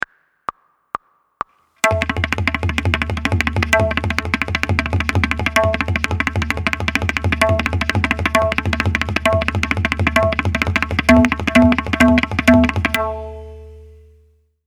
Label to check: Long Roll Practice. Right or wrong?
Right